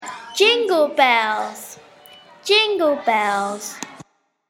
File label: jingle bells